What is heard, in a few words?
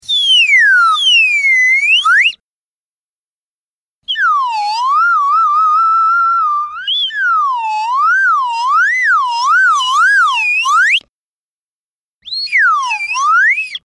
comical vibrato